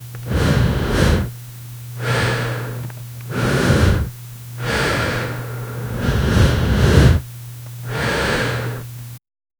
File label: a lady woman breathing so; bed